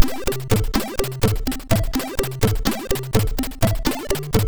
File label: sid MOS6581R4